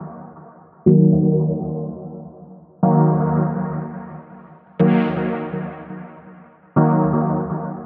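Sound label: pure analog deep house loop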